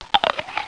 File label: apples